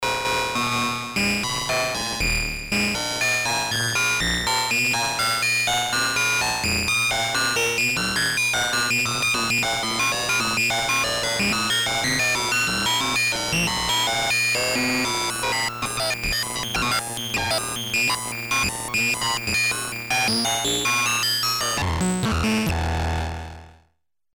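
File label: metal, Compact analog monophonic synthesizer; metal noise; MFB nanozwerg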